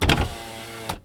hydraulic; Sound Design Tools; Raw Foley

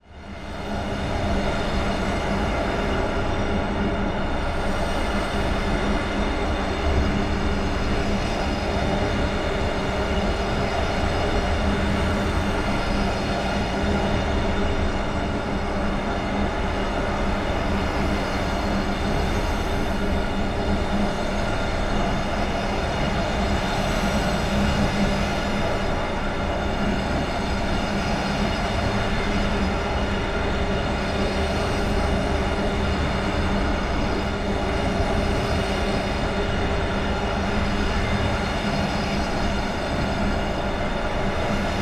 SPACESHIP INTERIORS HIGH; Filmnoise Inc

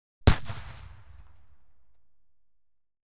Gelignite, 2x8 Oz Sticks Explode; BBC Sound Effects Library; Explosions Guns Alarms

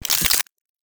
Oneshot Mechanical; Mechanical Morphs And Mutations

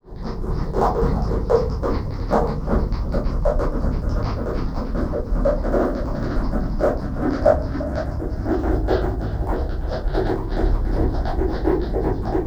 dark ambience, Scary Ambiences; Bluezone

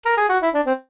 jingles SAX; Kenney's Sound Pack; Music Jingles

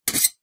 sword Metal; Kenney's Sound Pack

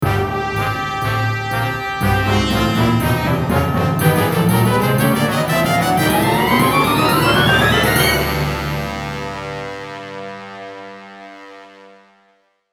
Ringtone; Leadings and Stings

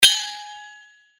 Sword Sound; FXHome; Fighting Sounds

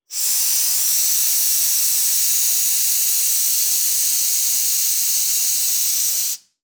Compressor, Healthcare; Nikko Barrera-Amaya

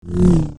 Saber; FXHome

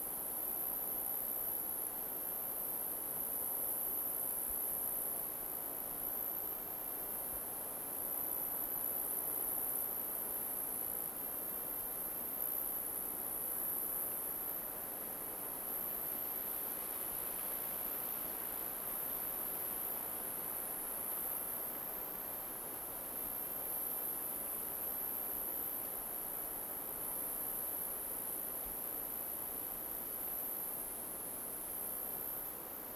forest alpine crickets loop; Nature Ambience

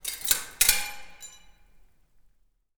Glass Scrape; 99Sounds; The Warehouse

